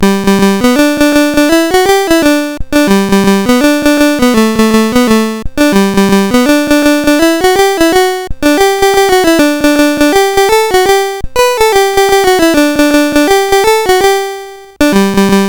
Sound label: sid CSG8580R5, GAMES; Ape Craze